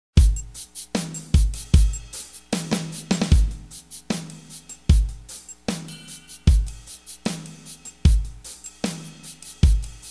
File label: pop drums